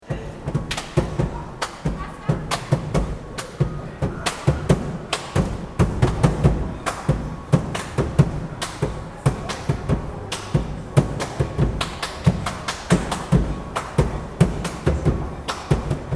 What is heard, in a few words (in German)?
Kaufpassage Rhytmus